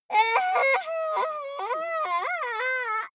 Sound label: child laugh fake breathy